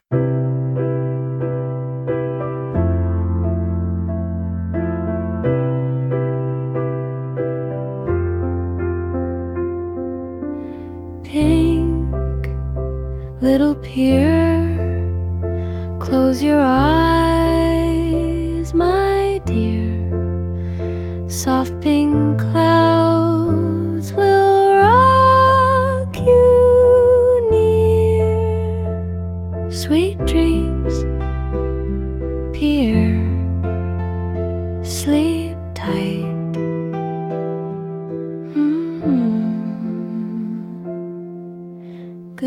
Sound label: pink lullaby